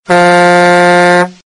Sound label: Big Trucker Horn sound alert